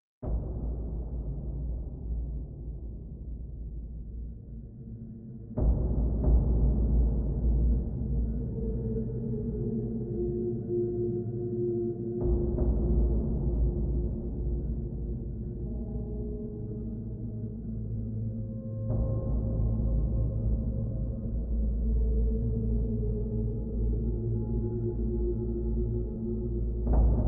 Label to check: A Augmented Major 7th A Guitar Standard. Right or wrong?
Wrong